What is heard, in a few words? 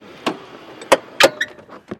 golf cart stop and change gears mono